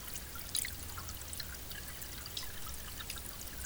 Salvelinus fontinalis, brook trout, splash and snitch; on video; soniferous